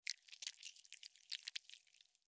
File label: MedicalScientific; Soundfx III